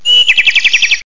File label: bird